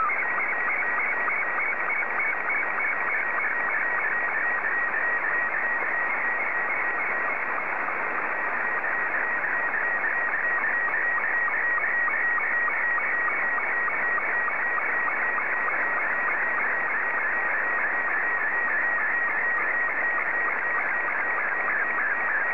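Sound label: Robot 72, slow-scan television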